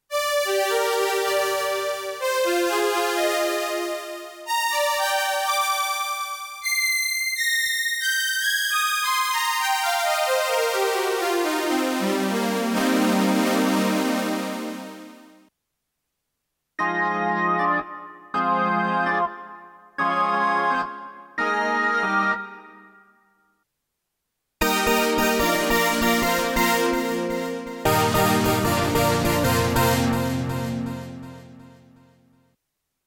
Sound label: Korg Prologue